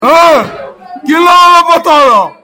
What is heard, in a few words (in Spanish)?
los gritos